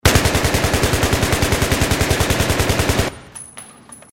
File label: ak 74 auto inside